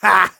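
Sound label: laugh short, German; Kurzes Lachen 4; Scout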